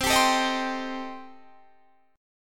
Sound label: C Minor 6th, chord